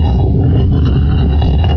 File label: stone moves